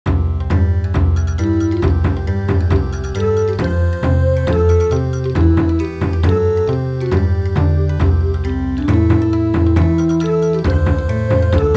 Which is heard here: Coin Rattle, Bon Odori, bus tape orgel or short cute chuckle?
Bon Odori